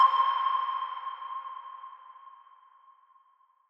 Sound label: Submarine; Ed Edd n Eddy; Adromulus Pack; EEnE